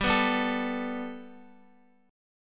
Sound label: G Major Add 9th Guitar, chord; Gadd9; strummed; x,x,7,4,7,5